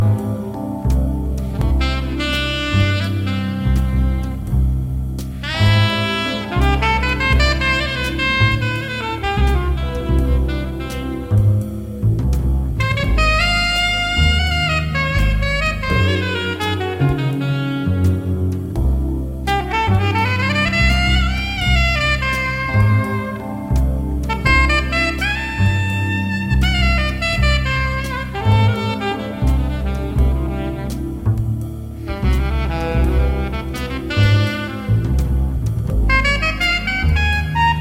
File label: Song Alto; Marmaduke V6